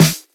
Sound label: snare